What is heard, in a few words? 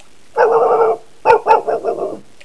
dog